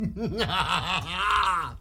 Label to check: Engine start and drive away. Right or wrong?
Wrong